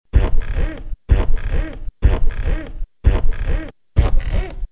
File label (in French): Lit grince, bruitage